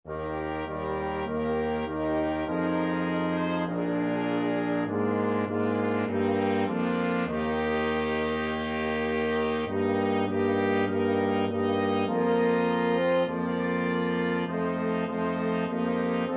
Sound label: onward christian, brass quintet